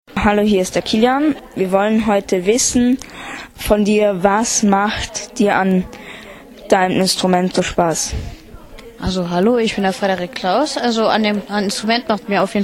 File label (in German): Horn; Blasinstrumente; culture kids